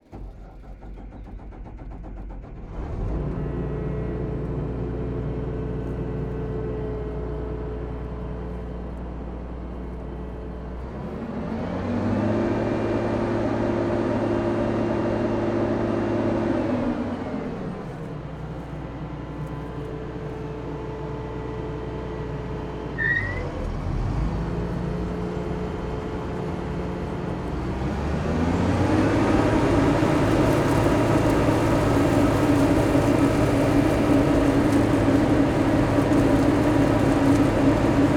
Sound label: Harvester Claas Lexion 530 - Turning Engine On to Idle to Running to Harvesting; mono Kmr81i; Sound of Essen